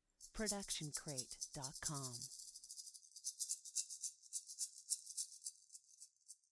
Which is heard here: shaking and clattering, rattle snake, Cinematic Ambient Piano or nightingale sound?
rattle snake